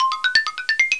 door win; McDonaldland - Silly Games; McDonald's; dominoes

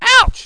ouch

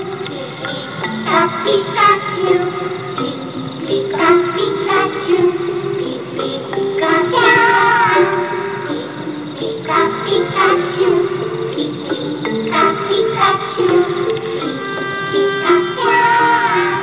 Pikachus singing; Cartoon Sounds; Poke'mon

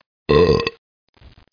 belch

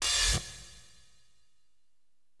STAB, AcidJazz Funk Fx; LiveAct Pool

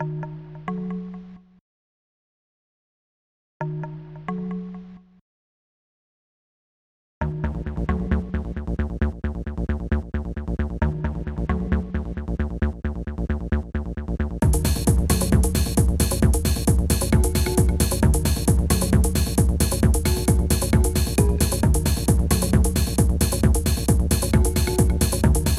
Menu Tune; EGG-2; Maelcum

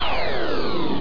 Slow Down; Macworld (1997-11); Games World; Shareware Games; Arcade; Peng! 1.0

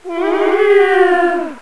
howl; duke nukem total meltdown

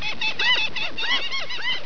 SEAGULL

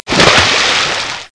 goat Splash; Greatest Stories; bilgoats

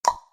Tongue Living Room

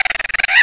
WASP; EXHUMED

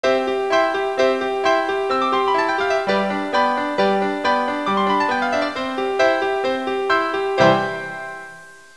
PIANO; Pipo-Aprende musica con Pipo

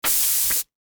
Pin Sweeper Down; bowl blast

